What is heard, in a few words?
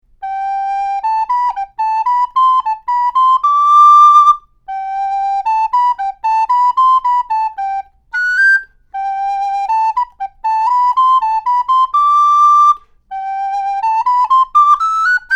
Flute